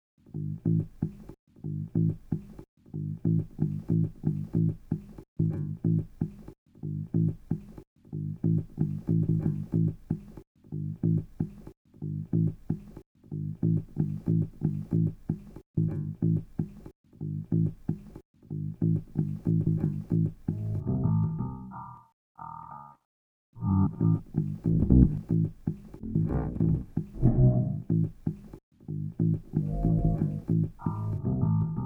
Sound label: Bassax